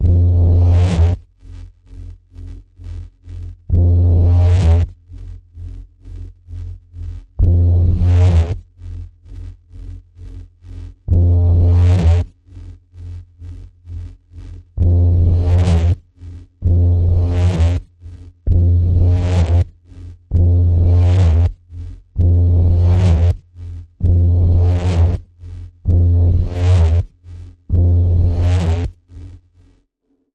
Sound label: Ominous Pulse F, Key of F